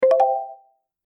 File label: message notification